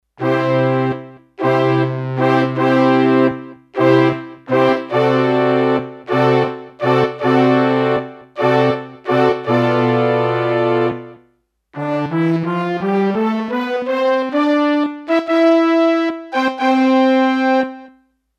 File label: Trombone Section, Roland D-10; Factory Patches